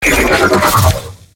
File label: VTOL sfx